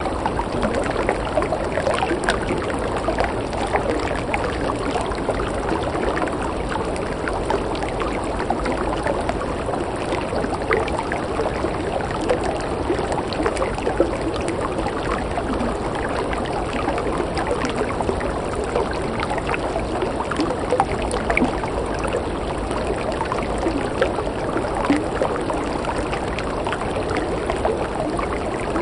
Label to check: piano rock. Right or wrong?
Wrong